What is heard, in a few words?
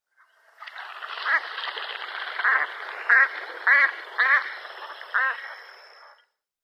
American Black Duck female